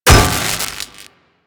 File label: Electro Prod Hit; Warframe; Weapons